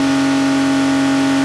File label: Audi R8 Plus High; gamerip; Car Simulator 2; android